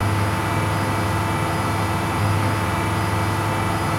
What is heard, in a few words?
Elevator Travel Down, ambience spaceship